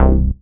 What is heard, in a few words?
Synth Bass; Vengeance Samples; VEE Synth Shots